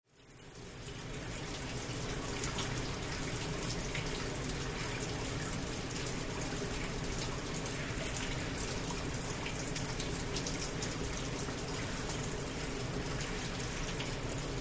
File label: Industrial Ambience, Leaky Corridor